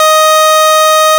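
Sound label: 106 E flat 4, Juno 106 Vintage Keyboards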